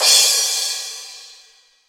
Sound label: cymbal crash sound d key; Duration 0:02; Samplerate 44100 Hz; drum sample; one shot